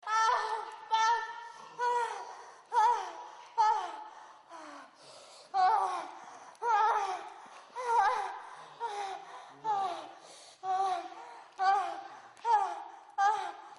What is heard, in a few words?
sex, moans; The Witcher Hunt